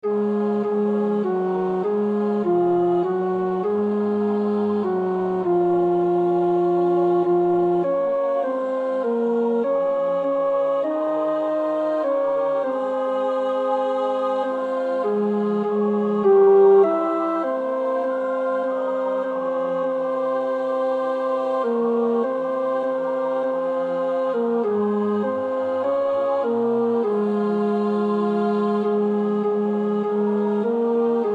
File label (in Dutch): tenor, koorpartij oefening; Stainer (John); The Crucifixion